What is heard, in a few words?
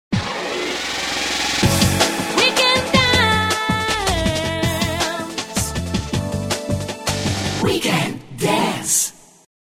Jingle